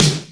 BIG O SNARE; Greytsounds; Scott Peers Sound Engineering

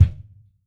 METAL; ILIO - Ethno Techno

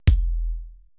prc c5, Procussion Mix; Retro Sampler; EMU E-III

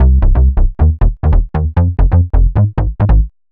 Bass 136 C, uk garage; Stat Bass; 136bpm Lines n Loops

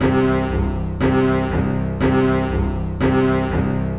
120 C string, String Loops, Trance; Trance Explosion Vol1

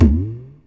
fm tabla, single shots; Sonic Foundry (Sony Creative Software); Ugly Remnants VOL-2; Beatstuff

